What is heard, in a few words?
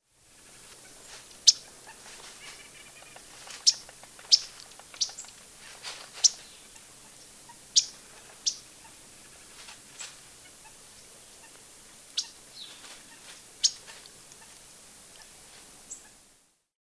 Yellow-rumped Warbler Audubon Setophaga auduboni; warblers